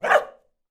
animals dog med bark inside

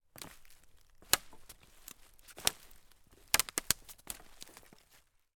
foley footsteps twigs small branches forest floor

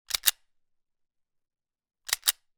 weapon gun walther ppq; still north media

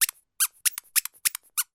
toy squeaky squeaks

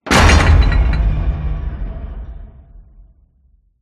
CINEMATIC IMPACT Hit Metal; smartsound

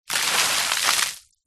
nature water pour splatter concrete; zapsplat

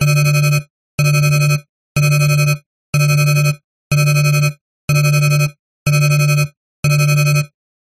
science fiction alarm loop

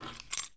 household key car electronic pick up from table

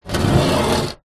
wood drag scrape on rusty metal table; foley; zapsplat

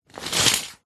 household curtains old draw pull

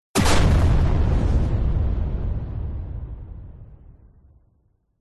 explosion loud punchy powerful with fire

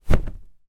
household mattress drop down on bed

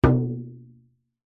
drum tom hit strike single high pitched

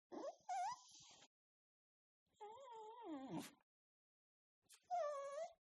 DOG Whimper Attention Seeking; ANIMAL; smartsound

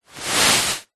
household bed head movement on pillow

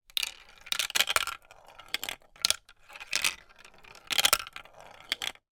toy marble run marble down plastic track

